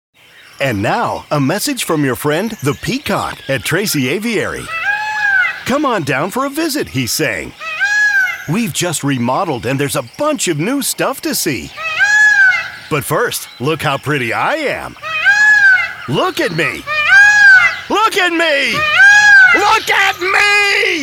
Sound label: Peacock; Tracy Aviary